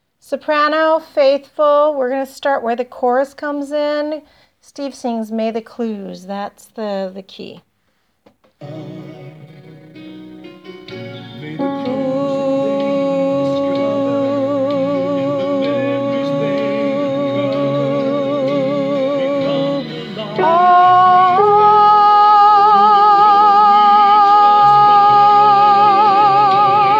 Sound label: sop faithful